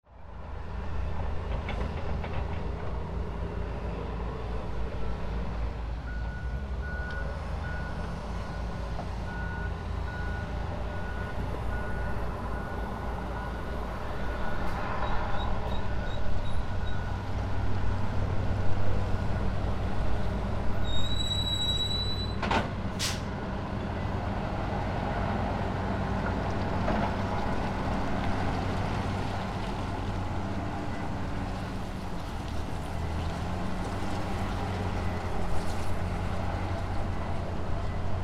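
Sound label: construction, Fukushima Soundscape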